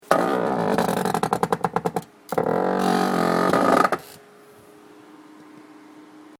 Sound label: chair